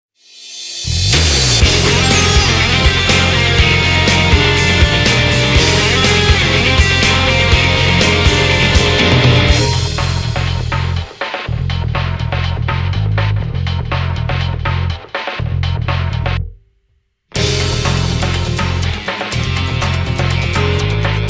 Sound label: Instrumental